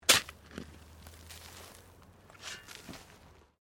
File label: Digging Shovel Sand; Various digging tools and processes; Construction Sound Effects; Construction (Sound Effects:Backgrounds)